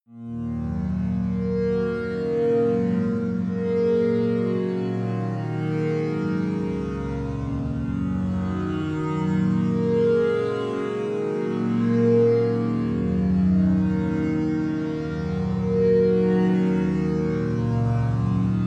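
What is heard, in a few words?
diffuse asian strings low, Pads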